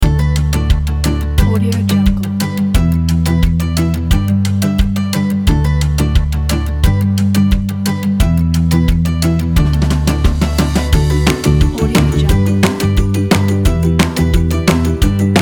happy joy children

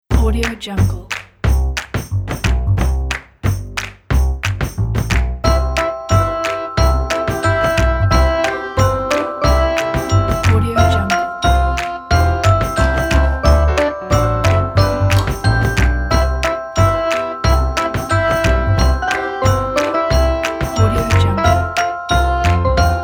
jingle bells fun

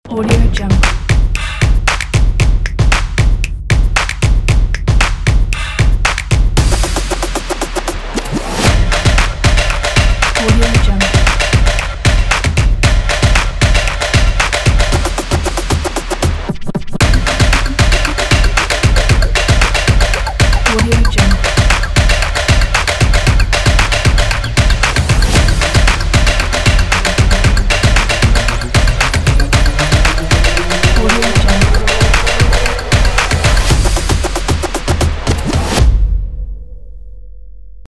stomp drum scratch

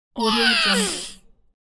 cartoon character pain yell

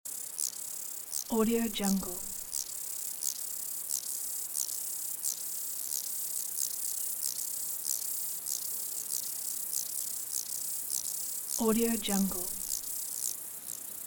grasshoppers field ambience

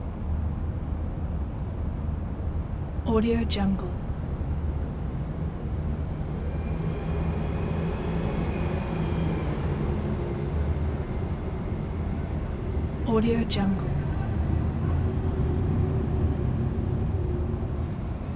city night ambience; prosoundfx

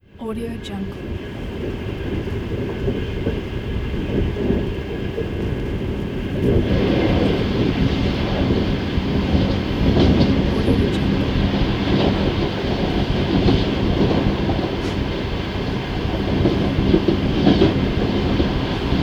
inside in carriage passing by oncoming train

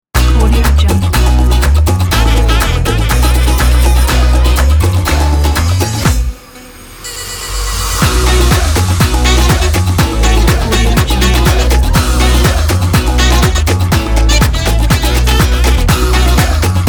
corporate brassy fun

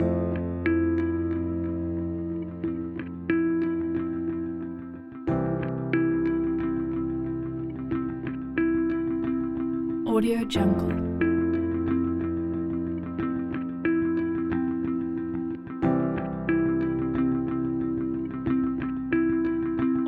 cinematic indie; music waves production

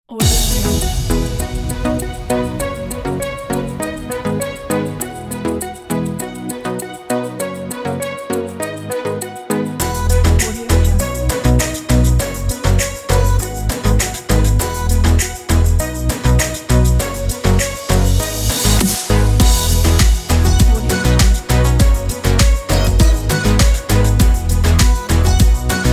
summer vibes

